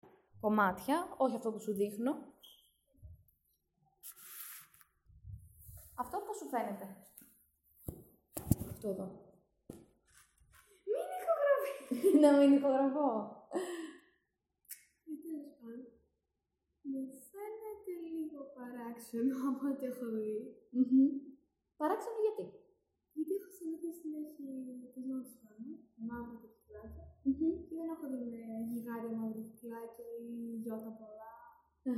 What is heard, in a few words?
synthesizer experimentation